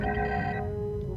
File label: Red Alert; Defiant; trek